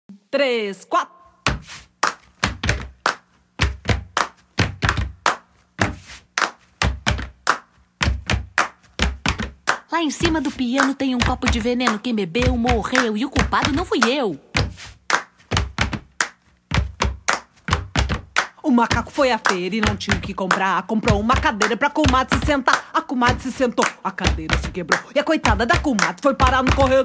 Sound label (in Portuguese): Rap da parlenda